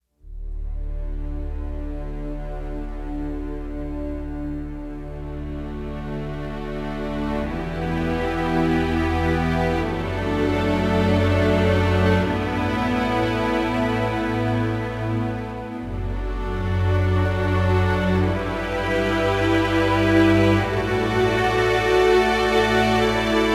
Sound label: no choir